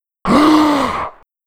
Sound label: Soldier Reach